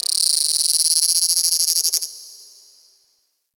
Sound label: Ocean Critter Insect; Warframe; Grineer Ocean